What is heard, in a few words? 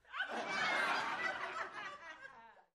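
Crowd 50 Single Medium, small int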